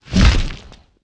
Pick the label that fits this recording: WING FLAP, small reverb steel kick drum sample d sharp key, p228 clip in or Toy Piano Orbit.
WING FLAP